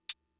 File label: closed hats, Fricke MFB-522